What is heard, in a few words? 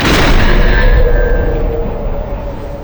yasuo base quickdraw wind; LoL